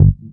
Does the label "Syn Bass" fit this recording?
Yes